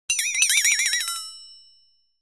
Bouncing Twang ( Metal ); Descending Bouncing Multi-pitched Metallic Twangs.